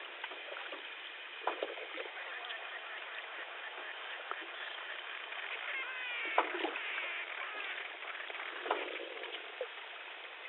duck like and screech

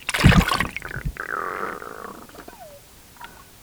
Salmo salar, jump and sounds; soniferous

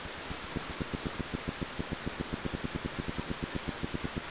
Melanogrammus aeglefinus haddock; soniferous

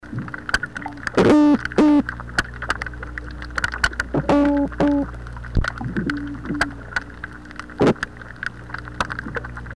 Love call of the gulf toadfish; soniferous

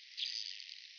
high pitched reeling sound

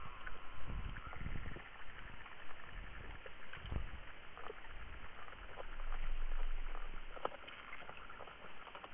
click train, fish; tivoli bay

